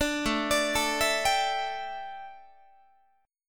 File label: A 7th Suspended 4th, A, down, Guitar